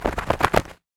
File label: Flapping Sound